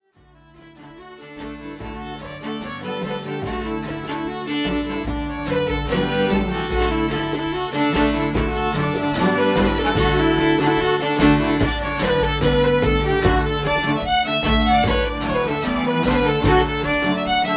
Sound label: Polka Set